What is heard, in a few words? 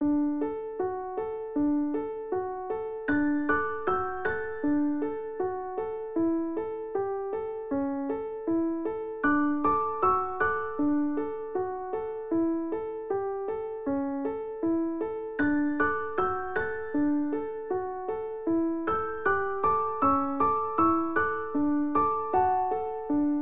Finished Already Piano; Animal Crossing